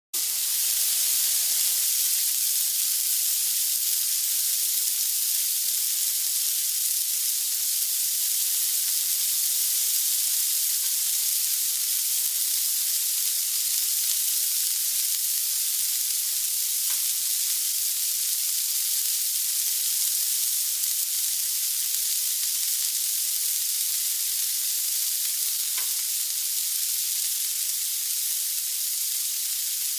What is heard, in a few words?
sizzling